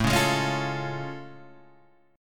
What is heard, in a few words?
A Augmented Major 7th A Guitar Open G